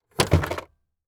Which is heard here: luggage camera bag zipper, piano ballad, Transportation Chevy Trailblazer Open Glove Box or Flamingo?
Transportation Chevy Trailblazer Open Glove Box